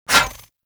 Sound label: Disarm Dart Shot; Warframe; Pacifist